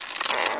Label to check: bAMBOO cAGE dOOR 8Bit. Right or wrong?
Right